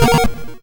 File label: YM Arp A#; chip hits; arps; CHIPSHOP DELUXE